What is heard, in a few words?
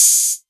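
Hat Open, Novation Drumstation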